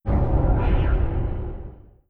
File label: distance railgun fire, weapons; Stellaris